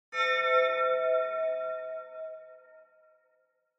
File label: Horror Accent, High Long